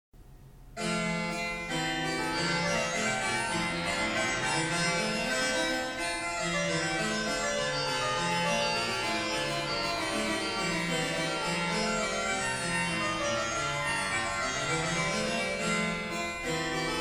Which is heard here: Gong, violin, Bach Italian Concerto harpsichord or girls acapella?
Bach Italian Concerto harpsichord